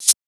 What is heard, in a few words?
Shaker